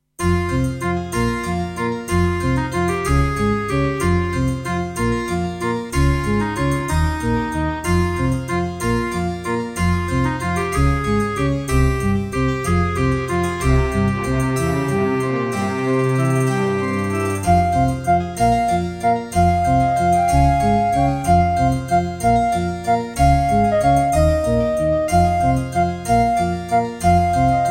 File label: Track 6, EMU SoundEngine; Death Gate